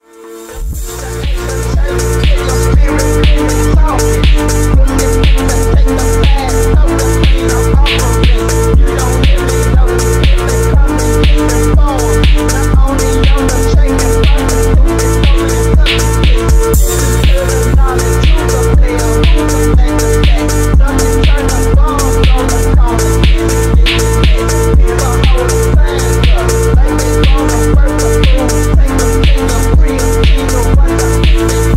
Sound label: phonk